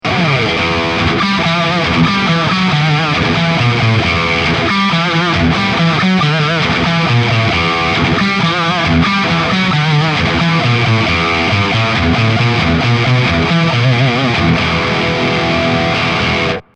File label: Metal Bridge Single